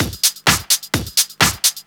Straight Loop 128 BPM, House

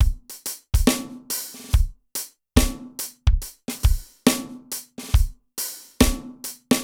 V Drum Loop

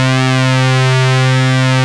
OSCAR C3, synthesizer; Hollywood Edge - Giorgio Moroder Rare Synthesizer Collection

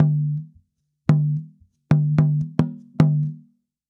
Tambora, African

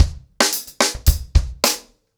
110BPM, ACOUSTIC DRUMS

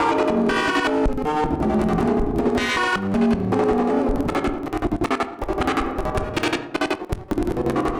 Battered Synth; malfunctioning synth samples

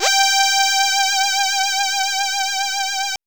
PAD L; AMG - Vince Clarke Lucky Bastard

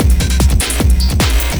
Processed Beat, Drums & Percussion

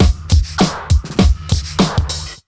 100 BEAT, Pure Electro; USB Soundscan vol.03; AKAI

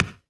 Percussions

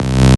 Basses Root C

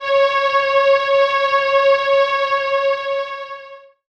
c#4, Violin, MIRAGE; Retro Sampler; Cellos + Violin

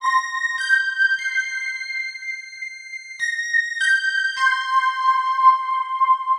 Lead High part, Drum & Bass Keys; Sonic Foundry (Sony Creative Software); Knutrix Drum & Bass

